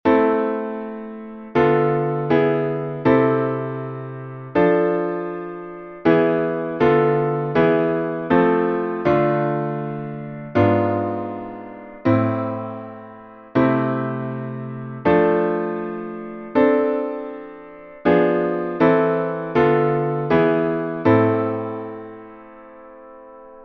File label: dominant secondary seventh; Essentials of Music Theory: Elementary